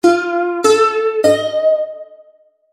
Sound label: balalaika; AJ Hipkins